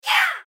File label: monster